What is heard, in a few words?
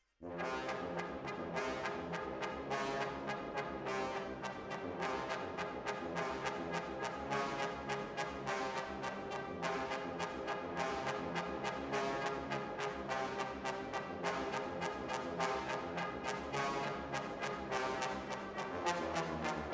Decca, Jazz for piano